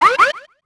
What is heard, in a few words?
Police Chirp